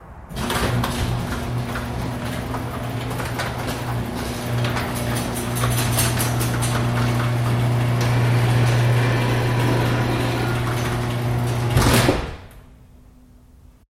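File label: sound of closing garage door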